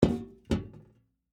Flower Pot Down, Set, On, Wood, Metal, Container, Full; Household; BlastWave